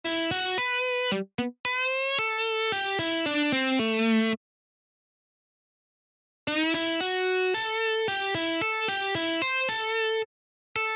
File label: vibratos